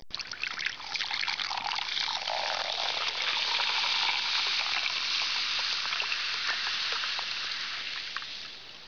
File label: POUR SODA; 10,000 Graphics Pack Volume 1; Media Graphics International